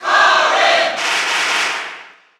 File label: Cheer German; Corrin; SSB4; SSBU